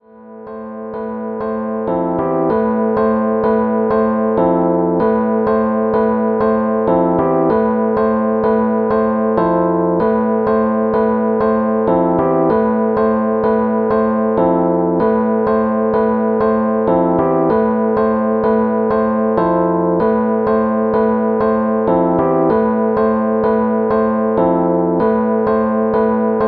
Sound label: Rhodes chord, hip hop chords; rhodes chord 09